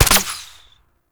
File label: Tranquillizer Rifle Shot; Warframe; Weapons; Tenno